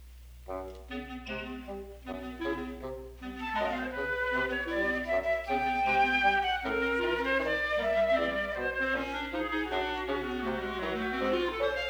Flute, Clarinet; Three Pieces for Flute, Clarinet

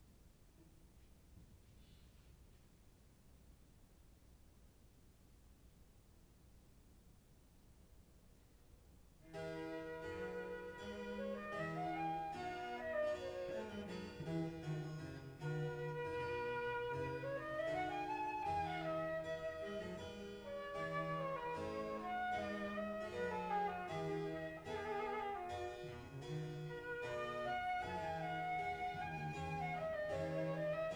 Sonata in E Major for Flute

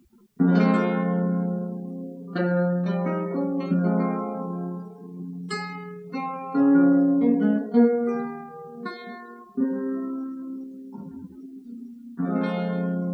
Elizabethan Lute Music

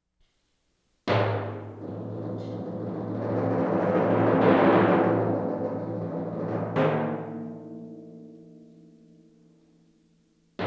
Timpani Solo

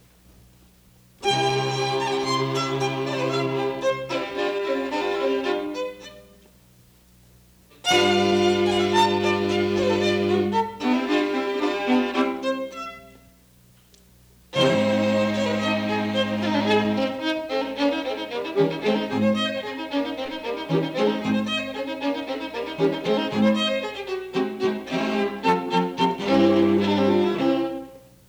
Quartet in C Major, Op. 54, no. 2

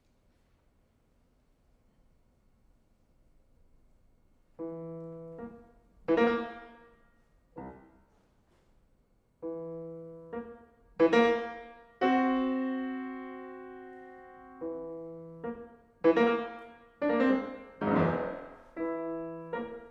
Piano

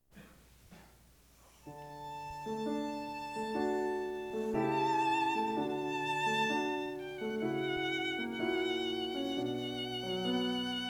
Sonata for Piano and Violin; Beethoven Sonata for Piano and Violin No. 6 movement 2

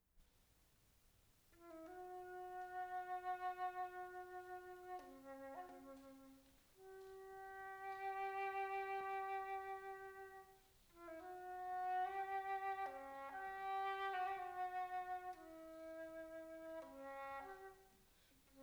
Density 21.5 for flute alone; Varese

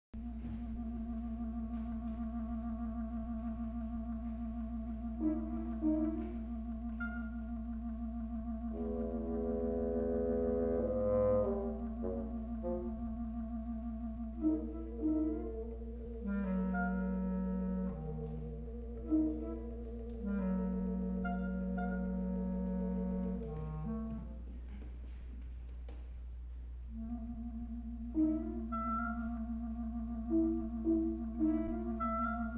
Woodwind Quintet

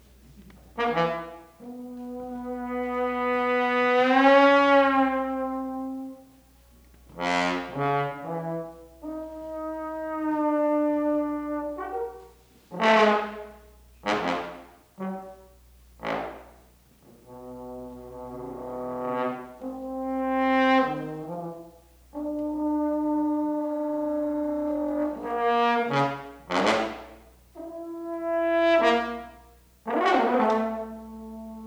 Animus for Trombone and Tape Reco

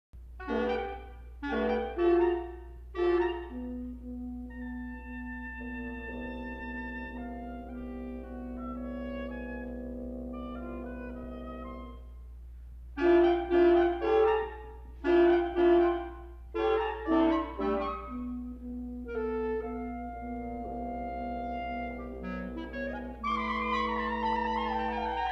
Woodwind Quintet

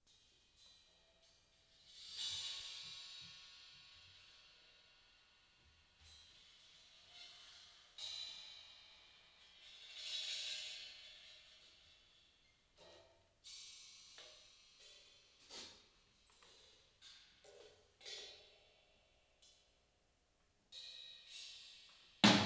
Drum City

